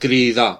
scream; gcompris

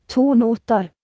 tux notes; ktuberling; games

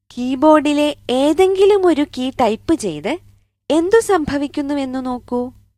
baby keyboard; gcompris-data

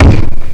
crunch

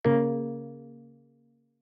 Sys List Match No; Oxygen; plasma

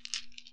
Stone Swap; KDiamond

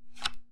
card pickup; lskat; games

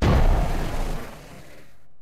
crash

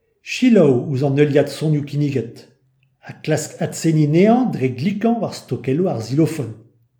melody; gcompris

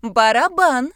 drum; gcompris-data

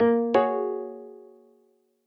Error Printing; Oxygen; plasma

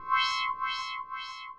tile remove; kmahjongg

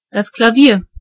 piano; gcompris

